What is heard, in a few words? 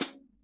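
hi-hat, Drums Acoustic; USB Soundscan vol.10; AKAI; RAW KIT 8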